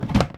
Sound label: chest open; breakhack